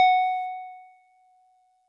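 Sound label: DigiPianet DX100 F#4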